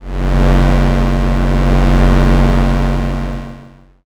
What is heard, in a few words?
space engine